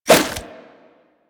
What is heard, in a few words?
Nunchuck End Whoosh G, Infested, Weapons; Warframe